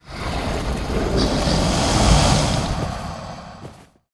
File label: dragon boss sleep death, monster